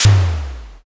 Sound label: pistol